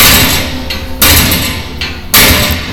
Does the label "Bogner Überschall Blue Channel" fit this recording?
No